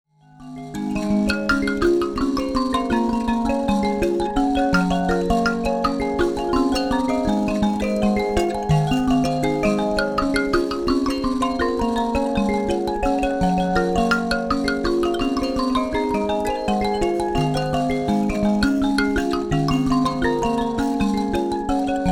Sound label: duet sample, mbira